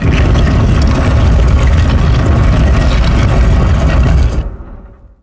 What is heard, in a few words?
statue head turn; DAoC